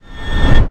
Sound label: multiplier fly; dark king